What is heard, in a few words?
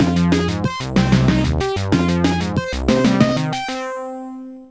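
rhythm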